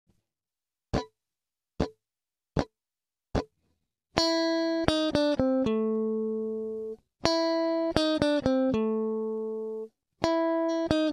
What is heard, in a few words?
r lick, blues guitar